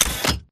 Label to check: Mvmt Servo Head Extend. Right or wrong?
Right